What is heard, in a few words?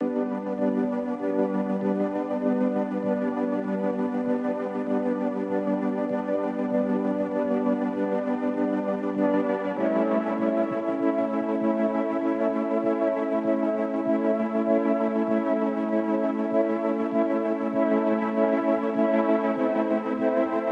Pad Chords